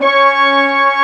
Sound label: BRASS 1 C3 -R; AKAI S6000 CD-ROM - Ultimate